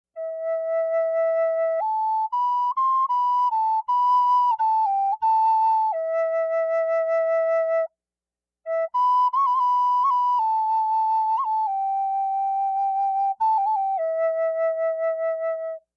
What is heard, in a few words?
Flute Purple Heart Small E; Flutes